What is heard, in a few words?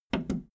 video tape player plastic fast forward click slow